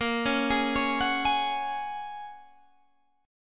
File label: Bb Minor 7th Sharp 5th, Guitar, Standard; Bbm7#5 arpeggiated